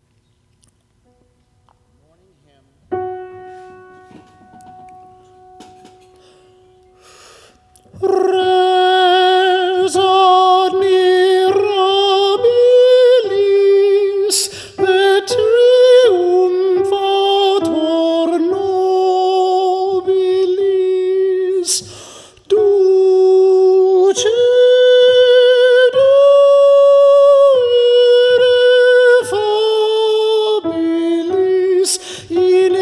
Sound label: morning hymn sop